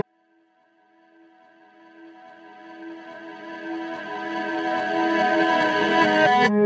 Reverse Synth, 144bpm, Abmin

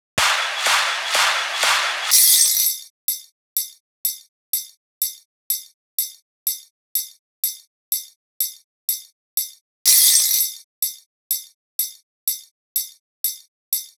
Drums Perc 124

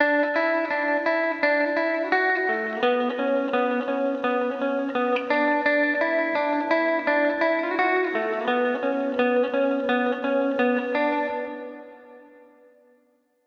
melodic guitar loop 85 BPM D

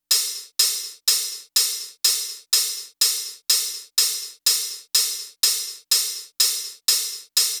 HAT LOOP, 124

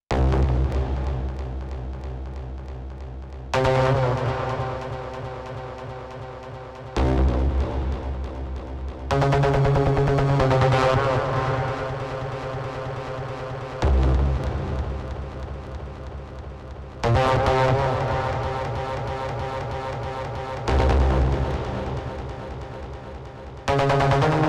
Synth

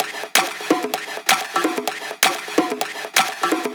drum loop percs 128

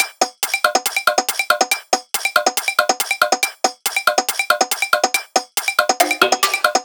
140 Chain Top

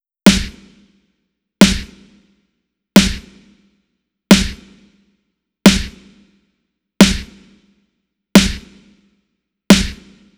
89 BPM snare; BASIC

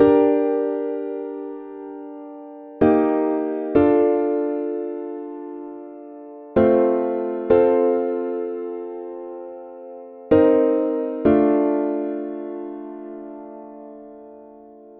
Piano G 128bpm Dry

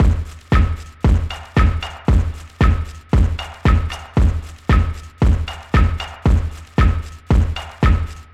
115, drum loop moving fours; FOREST STONE

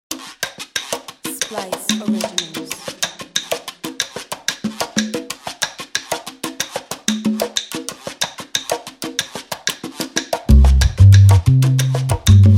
Latin Percussion

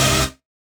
Rave shot C

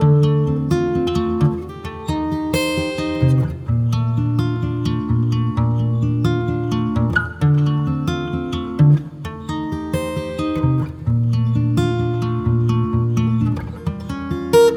130 guitar garnet Dmin

